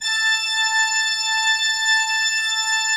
A5, Cathedral Organ; ILIO; Propeller Islands

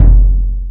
Bass Drum G#1; Proteus 2000; Digital Sound Factory Proteus 2000

